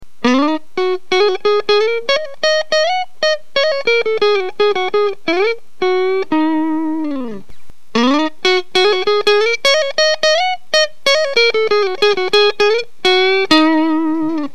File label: strat bright off on